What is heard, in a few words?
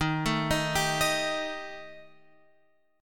D sharp Major Flat 5th